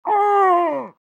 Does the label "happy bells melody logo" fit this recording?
No